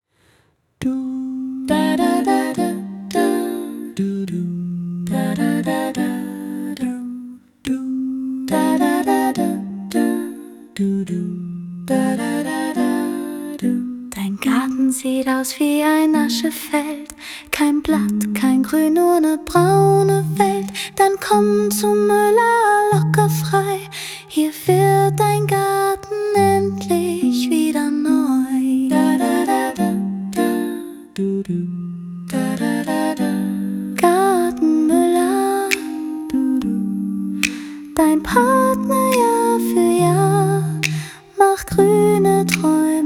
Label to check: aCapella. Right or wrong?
Right